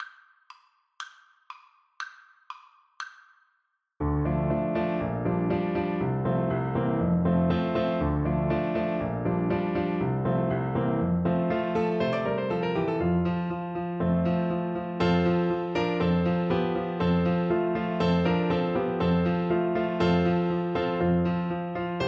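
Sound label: recorder karaoke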